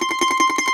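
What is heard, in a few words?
Mandolin tremolo, Plucked Strings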